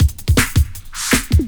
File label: Electro 162, breaks